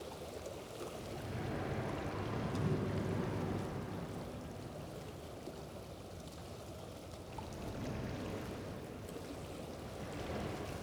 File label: atmos coral gorge